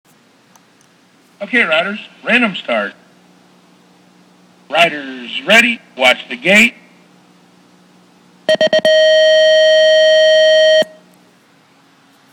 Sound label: Cadence Long; UCI